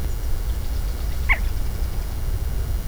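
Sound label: Black rail churt; National Marsh Bird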